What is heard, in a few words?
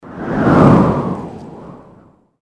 wind